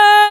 Vocal Oneshots; Vengeance Samples; Vocals

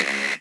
Synth Shot; Vengeance Samples

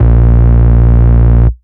Root A#, Ultimate Bass; Vengeance Samples

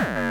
BD Distortion, Bassdrums; Vengeance Samples

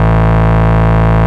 Bass Root A

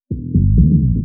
Slides; Vengeance Samples; VEH1 FX

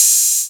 Open Hihats, Cymbals; Vengeance Samples

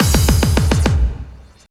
Loops Fills; Vengeance Samples

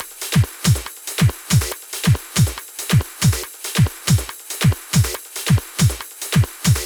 140BPM Lectrotrance Kit; Vengeance Samples

